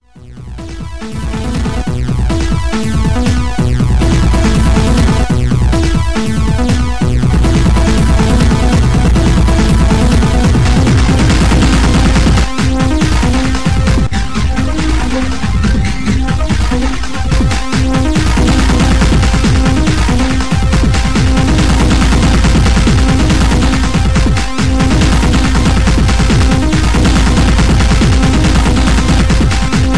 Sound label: DJ Session